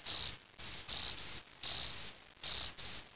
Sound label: cricket